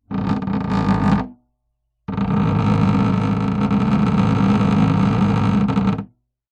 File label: wood hollow vibrate fast object; foley; zapsplat